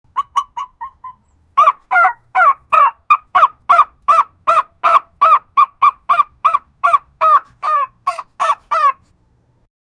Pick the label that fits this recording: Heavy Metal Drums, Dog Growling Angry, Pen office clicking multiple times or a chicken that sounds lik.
a chicken that sounds lik